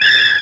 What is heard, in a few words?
keypress